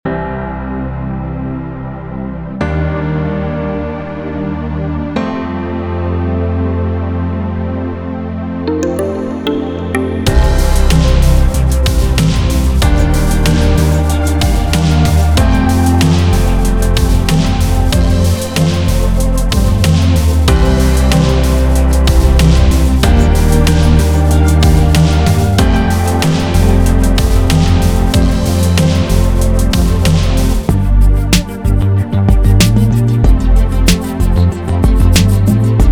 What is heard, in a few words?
BEAT; Lologo; leschi